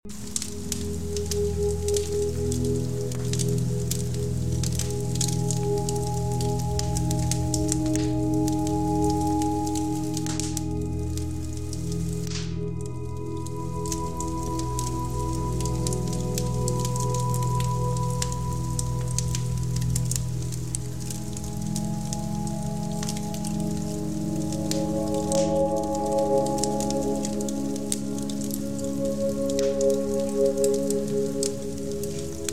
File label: Celtic Fireplace Meditation with 432hz Healing Music; Loopable